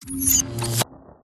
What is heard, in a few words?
Spawns Portals Teleports; PMSFX